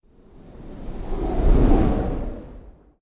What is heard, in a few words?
target cloud; Poodletooth-iLand; Panda3D